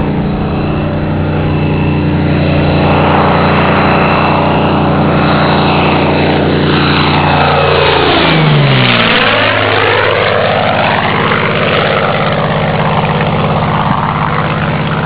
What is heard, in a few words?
p39 flyby; Aircraft Flybys